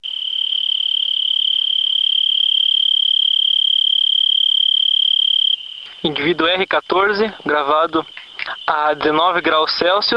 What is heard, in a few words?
Oecanthus pictus - 19degree Celsius